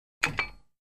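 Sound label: Gym Weight Equipment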